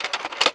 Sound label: Gun Reload Weapon, Weapons Foley; SFX Kit